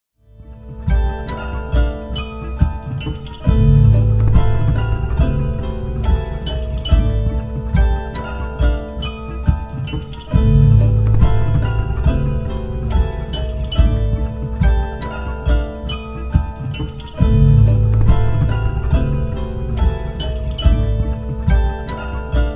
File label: loop